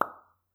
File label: plop, bubbles